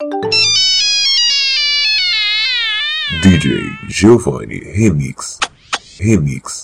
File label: Nokia Battery Dying